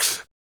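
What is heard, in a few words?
vox percussion, Vocal Planet Jazz FX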